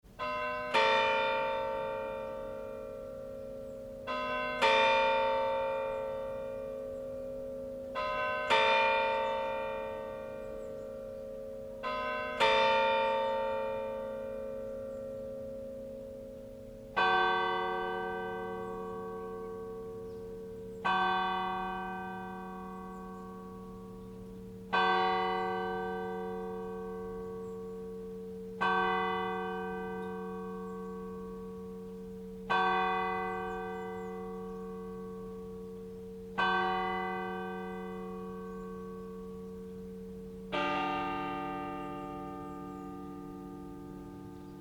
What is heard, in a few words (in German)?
Kirchenglocken von Alpthal